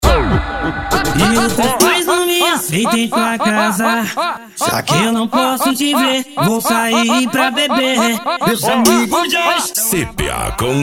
funk ritmado; cuiaba mt

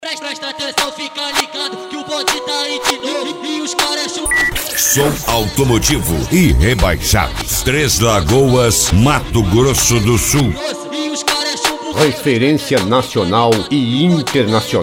som automotivo; rebaixados

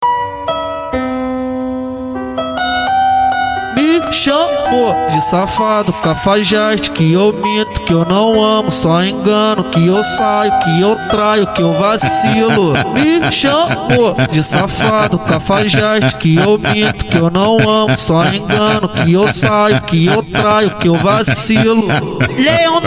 funk consciente e funk ritmado

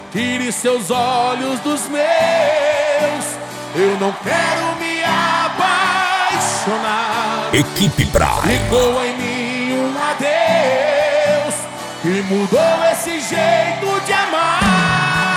moda de viola; frequency mix

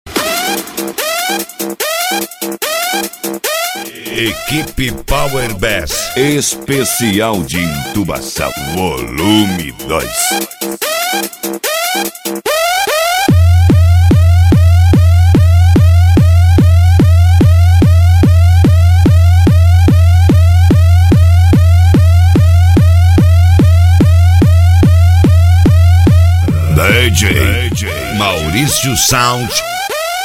entubacao

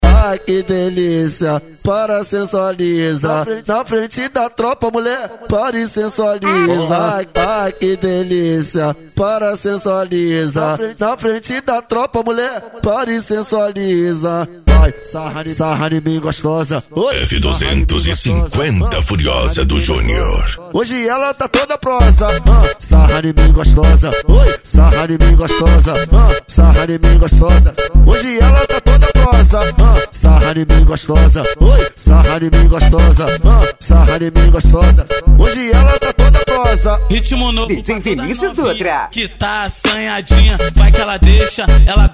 funk e sertanejo

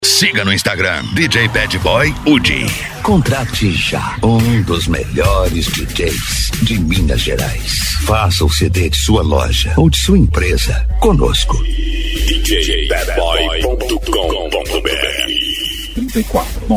forro; comitiva guenta nois

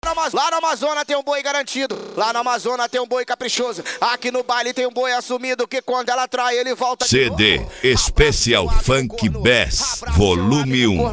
cd especial funk bass

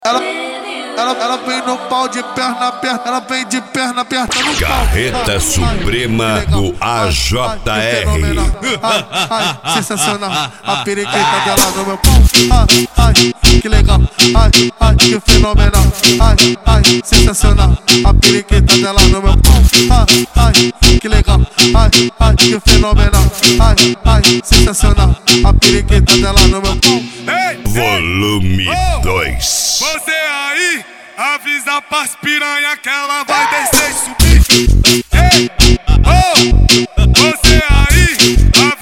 carreta suprema funk

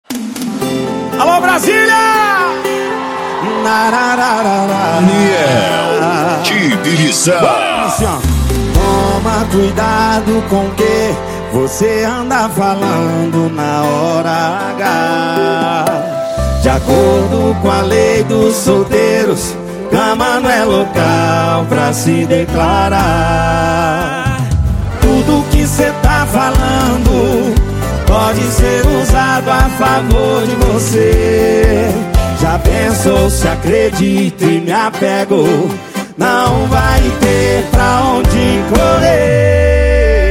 sertanejo com locutores de rodeio

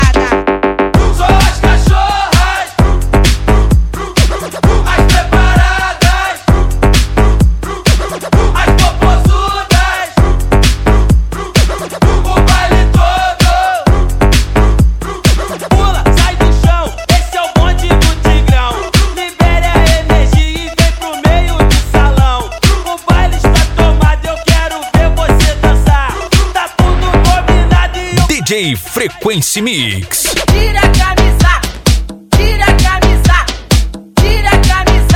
eletro funk; frequency mix

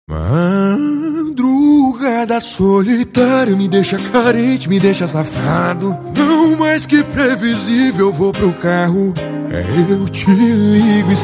sertanejo com locutores de rodeio

